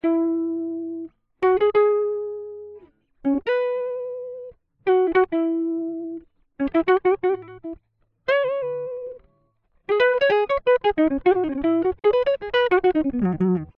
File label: Jazz Guitar; Ueberschall Jazz Guitar